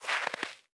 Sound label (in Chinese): 细雪踩踏声